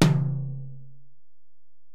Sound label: Tom, Alesis DM-PRO, Drum Machines, Toms